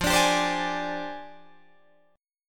F Minor 6th Add 9th Guitar DADGAD; down; chord shape

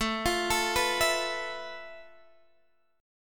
F Major 7th Flat 5th A, guitar chord

